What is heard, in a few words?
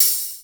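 hi-hat open; East West; Global Sounds; JD DANCE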